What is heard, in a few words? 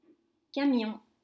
camion truck